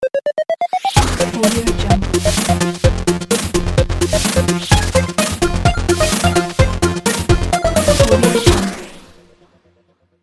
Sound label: 8 bit chiptune logo